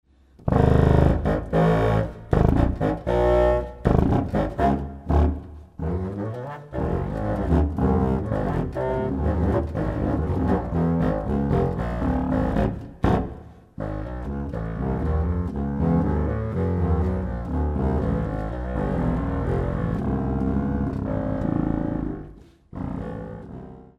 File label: Duet; I. Allegro moderato